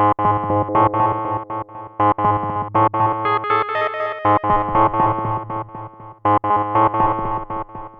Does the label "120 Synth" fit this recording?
Yes